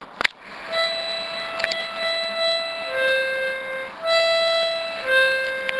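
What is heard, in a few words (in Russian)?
губная гармоника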